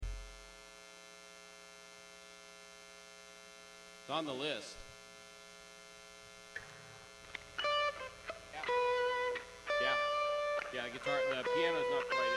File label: Buzz and Feedback Mix